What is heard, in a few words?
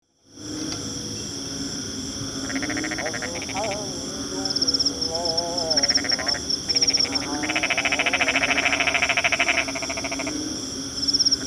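fejervarya cancrivora, Crab-eating Frog call; Air Papan, Johor, Peninsular Malaysia; Dicroglossidae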